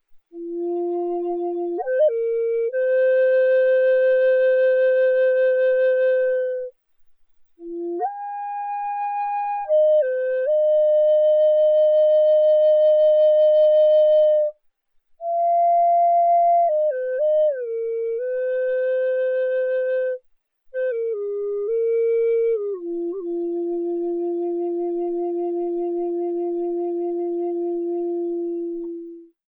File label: Large F Bubinga, flutes